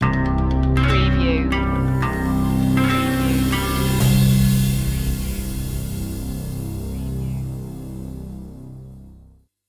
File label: eastern mystic stinger